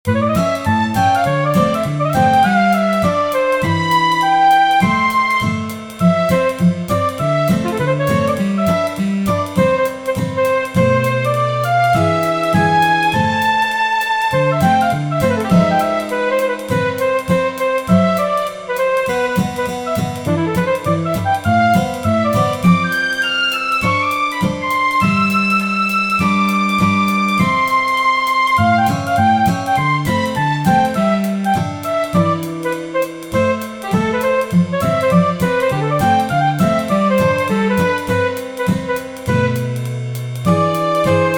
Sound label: taking you to the circus